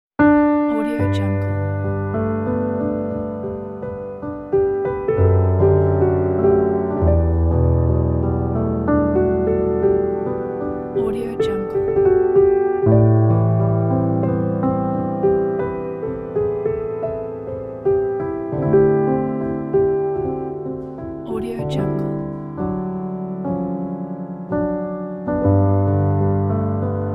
spring morning and piano

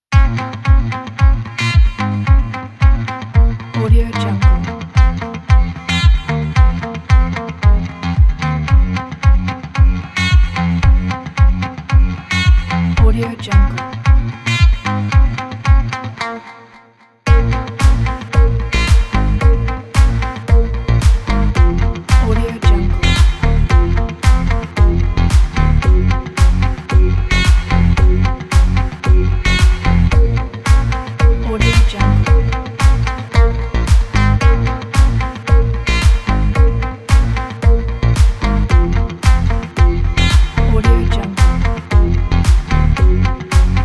summer guitar

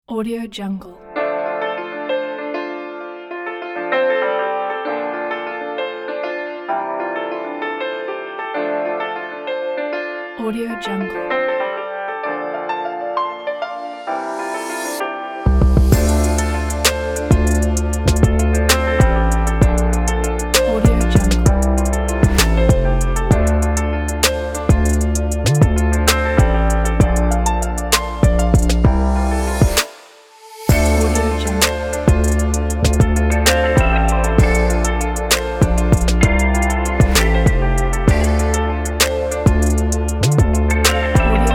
melodic trap beat

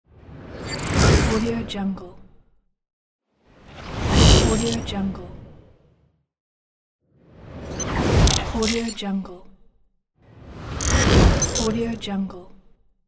futuristic whoosh; audio therapy

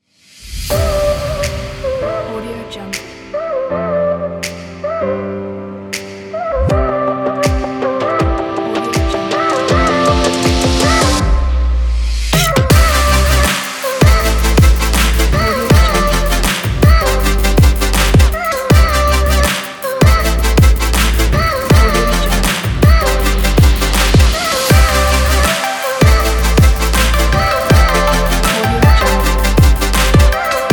future bass

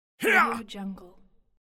male attack grunt; medusamusiclab

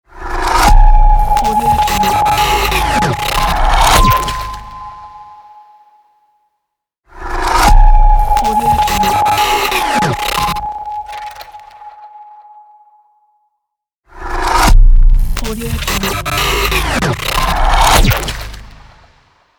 short glitch logo

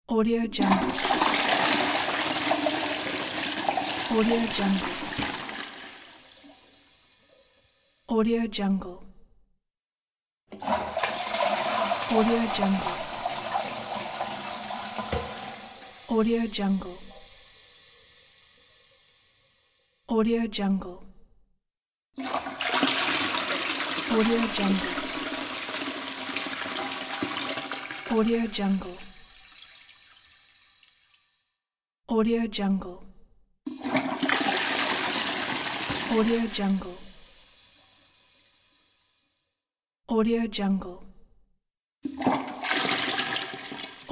toilet flush

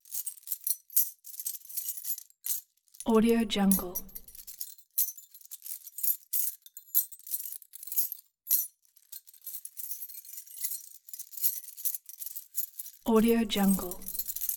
keys; soundbits-soundfx

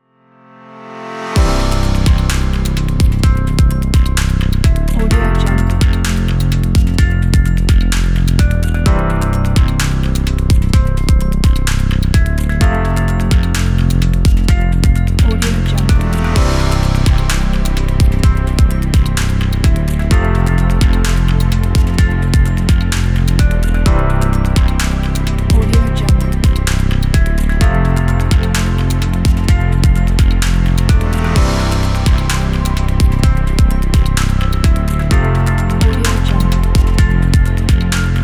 technology inspiring

